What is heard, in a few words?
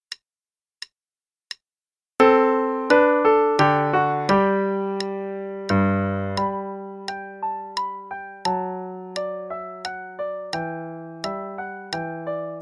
Minuet in G Major (qn=88); Bach